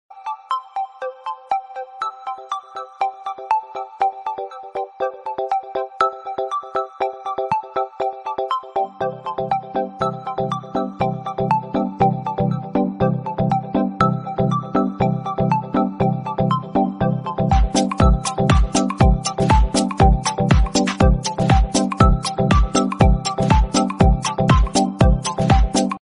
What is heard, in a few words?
samsung note, ringtones